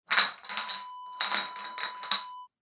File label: glass bottles clink